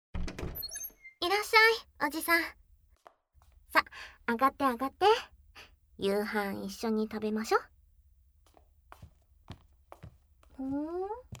囁き 耳舐め, ASMR; ずっと好きだった幼馴染…の娘。; 効果音あり

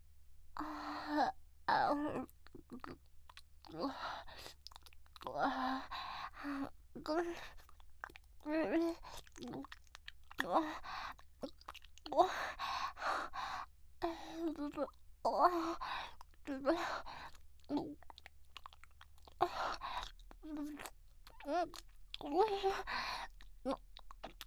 少しずつ ディープ な キス

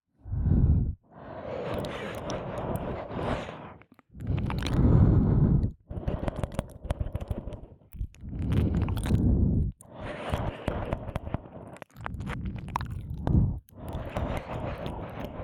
吸い込み耳舐め 右耳; 耳舐めコレクション

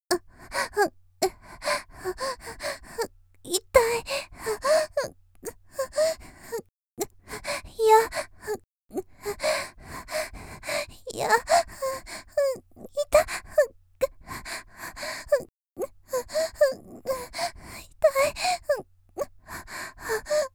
「喘ぎ」「痛い、嫌」と言いながら喘ぎ（嫌がり）; 綾波系; 少女

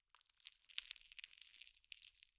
挿入音; 効果音

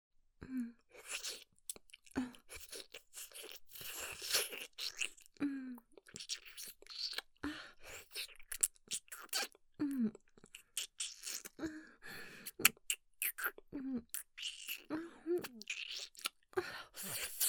耳舐め

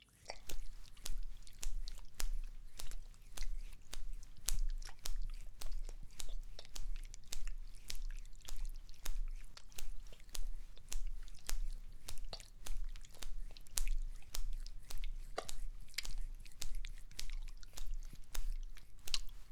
ピストン音（普通)

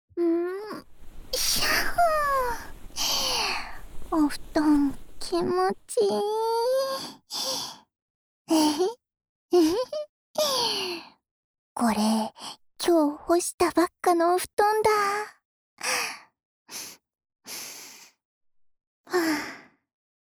添い寝（心音・なでなで）, ASMR

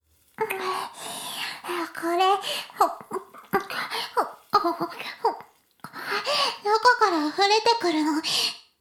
フェラ音声; 古き城のドラゴニート2

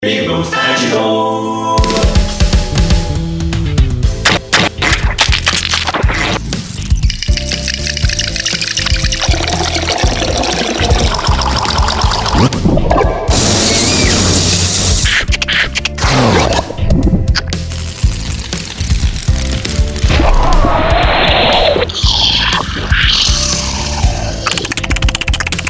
効果音素材集 戦闘武器粘液; ぴぐみょん; ぴぐみょんスタジオ

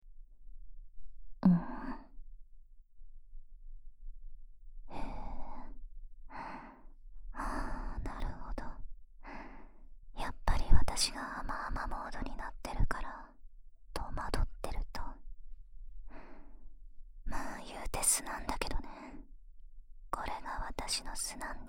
甘い吐息, ASMR

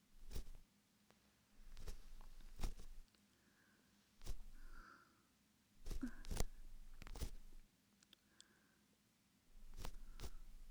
耳かき エンドレス 左

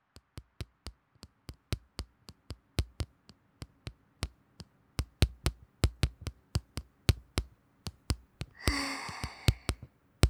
タッピングでお耳癒やし♪

